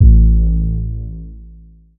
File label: Synth oneshot Short A, Synth Bass One Shots; Slate Digital BASSHOLE Sample Pack